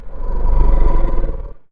big chicken breath

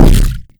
sonic gun hit, weapon

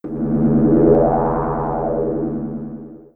teleport loop

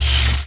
small lightning

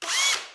turret move

